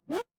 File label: retro game jump